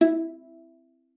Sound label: E4, VIOLA PIZZ; Xsample VOL-5; 90 s Sample CDs